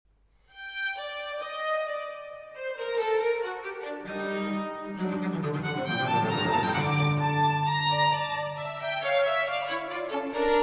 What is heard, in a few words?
Concerto For Strings and Continuo in G minor